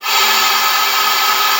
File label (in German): LUFT, OB D.AMORE; Xsample VOL-7